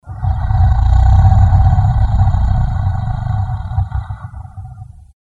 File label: growl slight